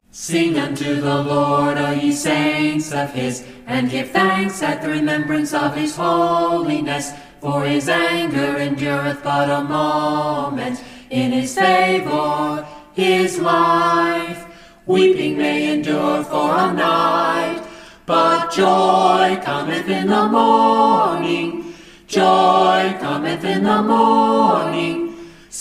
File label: a capella scripture song